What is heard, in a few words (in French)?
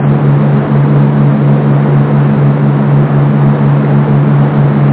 moteur ferry cata; BaseAuckland2